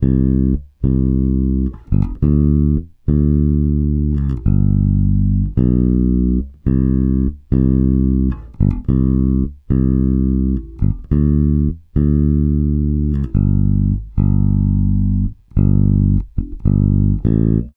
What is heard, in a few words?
Country Bass, Chorus 2; Digidesign; Pro Tools Essential Loops